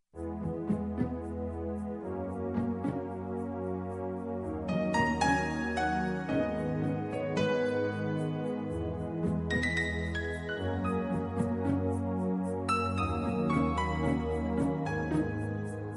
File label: soft music tone ringtone